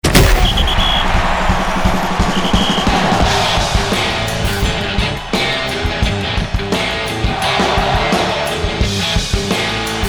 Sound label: Football Rock; ShortBusRadio; football stuff